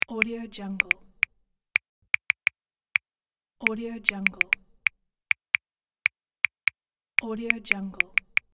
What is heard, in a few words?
smartphone typing